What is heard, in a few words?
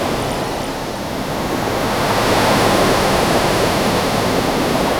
ocean waves on beach